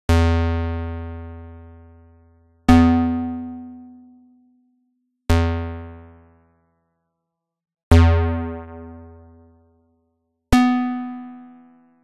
ram feedback sound processing

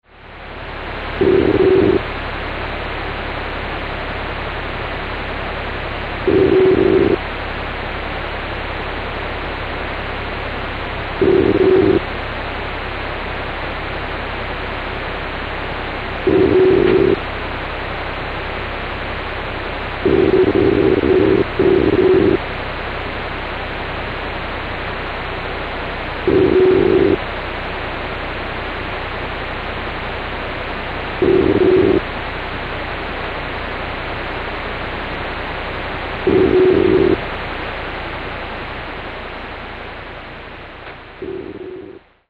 DCF49 129 kHz

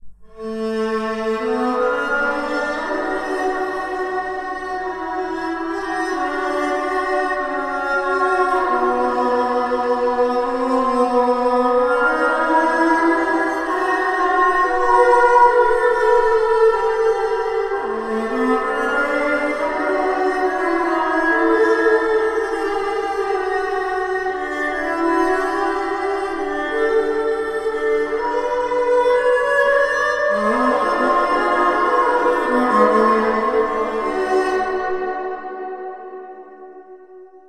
violin

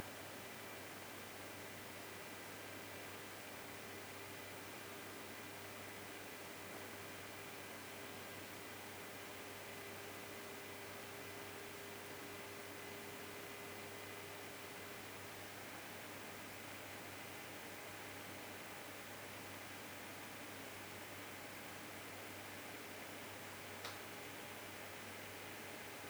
Noiseblocker XS-2

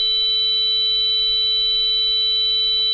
440Hz

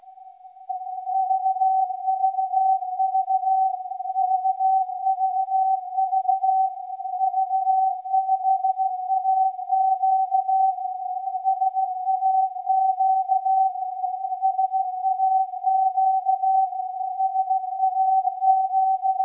SAQ Testschleife Audio

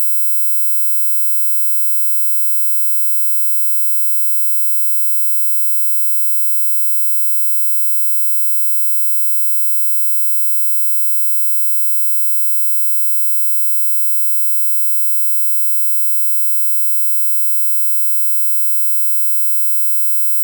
17200 Hz; 60 sec

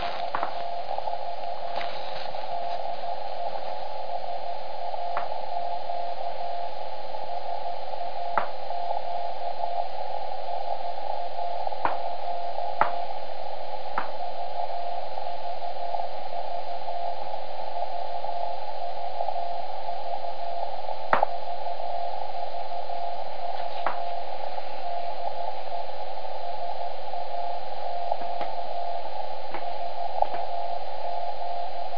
saq audio 11025hz

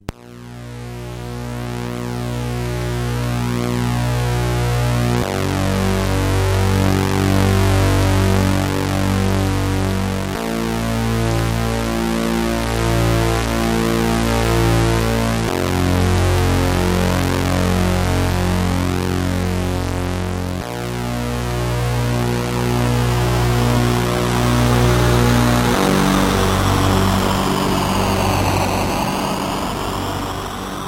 SIDBox driller short

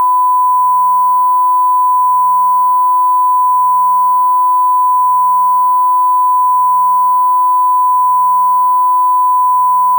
RDS AP Standard Msg 1 kHz

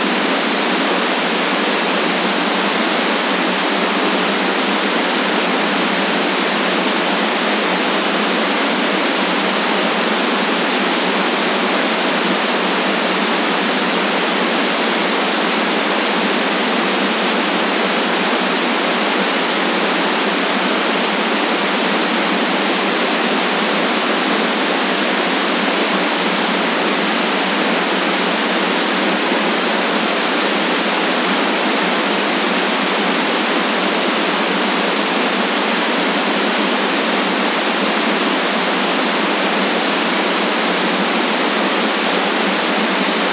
noise

